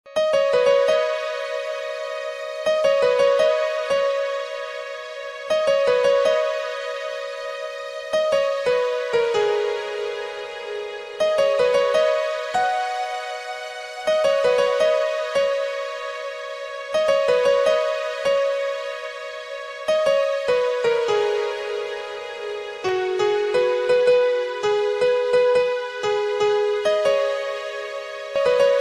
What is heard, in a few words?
ringtone instrumental